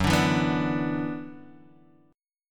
F sharp 7th Suspended 4th Sharp 5th, guitar

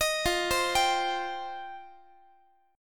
F 7th Suspended 2nd F down Guitar; F7sus2; arpeggiated; JGuitar

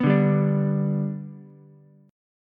B Minor Sharp 5th, Ukulele; Bm#5 strummed; 4,2,3,5; JGuitar

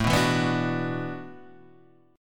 E 7th Suspended 2nd Suspended 4th, A

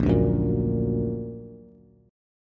Bb Augmented Bb, Bass; strummed; JGuitar

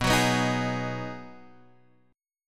B 6th B down Guitar Standard; strummed

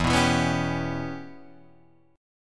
E Minor 6th guitar chord, Open G

